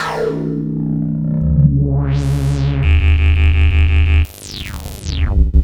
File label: Smart Bass, Drum & Bass; Fluid Dynamics